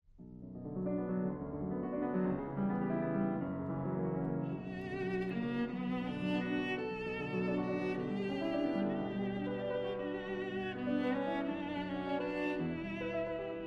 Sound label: Eight Pieces for Clarinet, Viola & Piano - II. Allegro con moto; Bruch